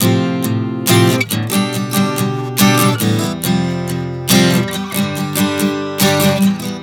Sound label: Progression 070 F-G, Acoustic Guitar, Chord Progressions 070 bpm; Sonic Foundry; Songwriter Acoustic Guitar Companion